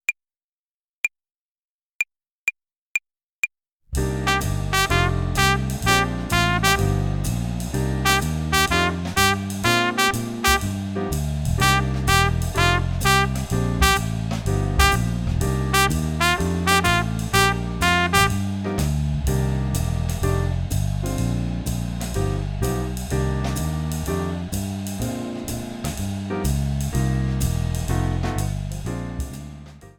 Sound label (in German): Swing-Phrasierung Übung 4, Play-along, Trompete; Jazz Club